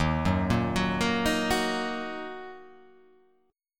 D sharp Minor, chord

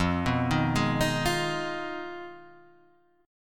F Minor 7th Flat 5th, guitar chord; arpeggiated; JGuitar

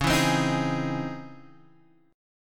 Db Minor Major 9th Db Guitar Standard; strummed; DbmM9; JGuitar

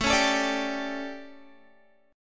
A 11th, Guitar, chord; 4E,4A,5D,5G,5C,6F; strummed; 17,16,19,19,19,x

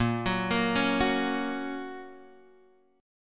Bb Minor Sharp 5th, chord; arpeggiated; JGuitar

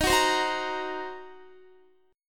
D sharp Minor 6th D sharp, down, Guitar; D#m6; strummed; 4E,4A,5D,5G,5C,6F; chord shape

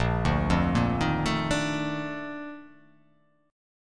A sharp Major 11th, chord

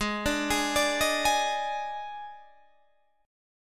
Eb Major 7th Suspended 4th

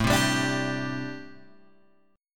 A Minor 11th A Guitar Open G

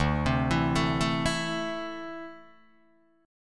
D 7th Suspended 2nd down Guitar DADGAD; D7sus2; arpeggiated

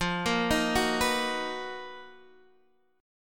B Major 7th F, chord; Bmaj7/F arpeggiated; JGuitar

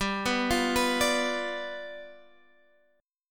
G 7th G down Guitar Open F; G7 arpeggiated

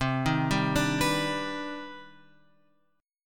C Minor Major 9th, chord; CmM9 Chord {8 6 5 7 X 7} arpeggiated

